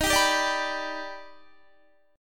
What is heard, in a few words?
Eb Augmented Major 7th Eb down Guitar; Eb+M7 strummed; JGuitar